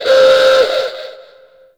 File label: Fortress Klaxon Alarm; Ambience; Gameplay; Warframe; Grineer